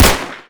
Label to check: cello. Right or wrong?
Wrong